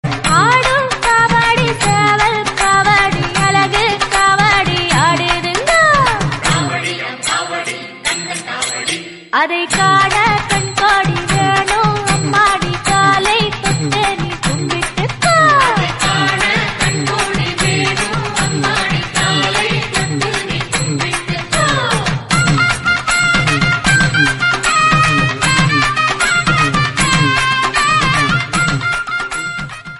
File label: murugan song dj remix